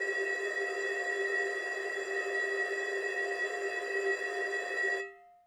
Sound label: tin can conv binary noise